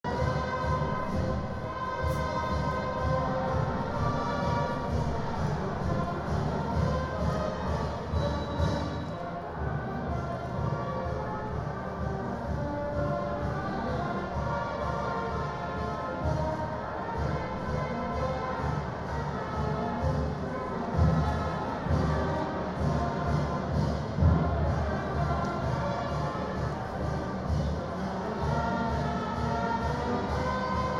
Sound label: classica